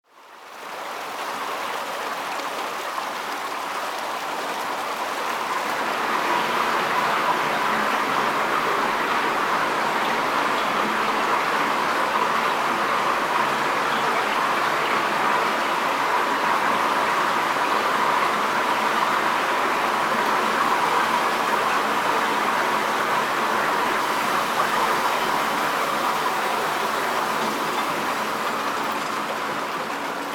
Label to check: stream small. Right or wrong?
Right